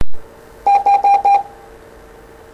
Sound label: Morse H